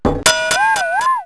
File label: COLLIDE